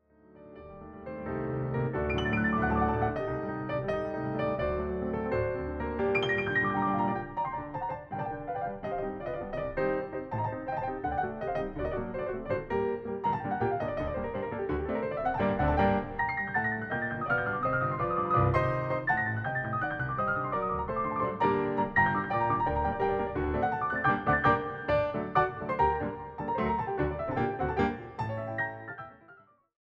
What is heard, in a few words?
Hungarian Dance in G Minor- Allegro Molto; brahms; 21 hungarian dances; WoO 1